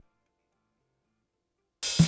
Cruisin' Disco Drums Intro, 70s Analog Funk; Sonic Foundry (Sony Creative Software); What it Is!

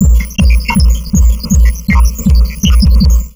160, Drum Loops Crazy Processed; SONMOD; USB Soundscan vol.07; AKAI

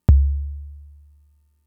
Kick, Drum Machines Fricke MFB-522

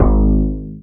BAS FM DX-7

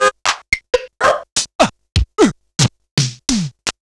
Sony DRP-2

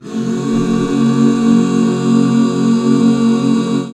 HAL GB, BACK CHORDS, Roland; Sound & Vision - Gigapack II CD 1

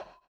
SONAR; Spectrasonics; Metamorphosis

